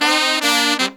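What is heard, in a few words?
Pop & Funk Brass, 110 Cm, left; Best Service ProSamples vol.25; AKAI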